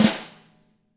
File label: DW FLAM, Drums; DW KIT1